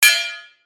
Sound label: anvil hit